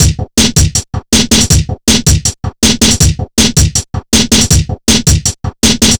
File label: Drumloops, 160bpm; Drum Bass; Zero-G; Total Drum Bass